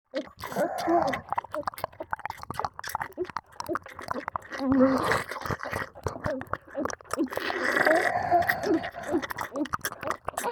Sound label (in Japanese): 即イキ用フェラチオ＆耳舐め; ロリ声ぷにマン×オホ声ビッチの濃厚両耳責め～エンドレスな精液搾取にご案内～